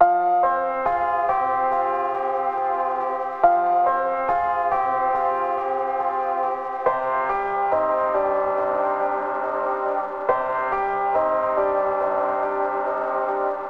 Detuned Piano Loop Fm 70Bpm, Granular, Lo-Fi; Granular Musical Loops; Ghosthack Advent Calendar; Lo-Fi Kit

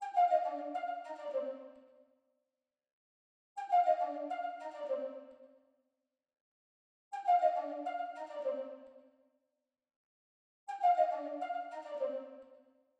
135 BPM C Min Flute; Loop Stems; Cymatics Gems Vol 12; Gems Free Teaser Pack; Monster

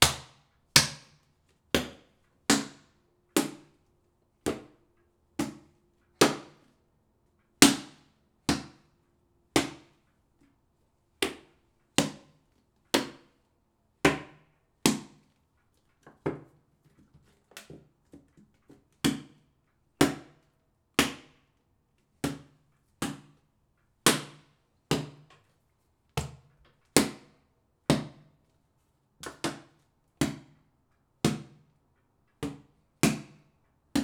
Plastic Bottle Body Hits; Fairlight Sound Lib

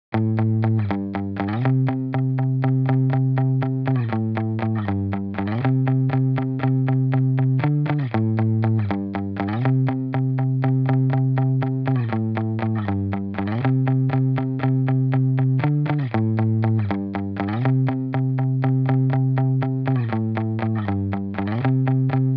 120 BPM E Min Muted Guitar; Cymatics; Miami Guitar Pack - Pt 2; Missed Calls

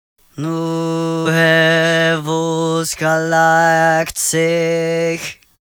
Chant Vocals Am 70Bpm; Construction Kits; Ghosthack; SkyRealm; Kit 20 - Sea Of Souls

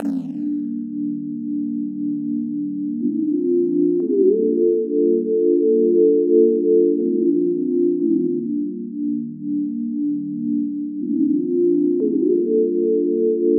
120 BPM G Min Pad; Cymatics; Blue Eyes

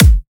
Kick Dubstep

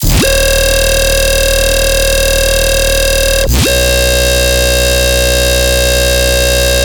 Bass Loop D# 140BPM, Dubstep; Fairy Bass; Ghosthack - AC2024 - Day 13 - Dubstep Sounds 2025

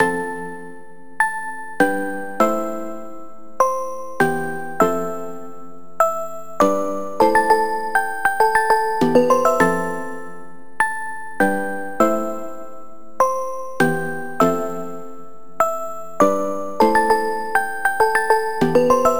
Chord Loop - 100 BPM A Min, Synths; Loops; Cymatics; EDM Starter Pack

